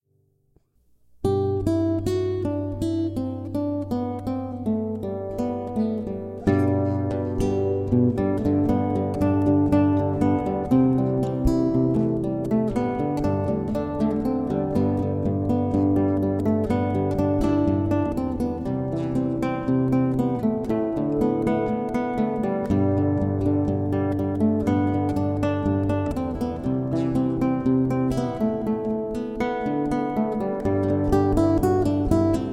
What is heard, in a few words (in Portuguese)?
instrumental